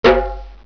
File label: Doink; ShinyMetal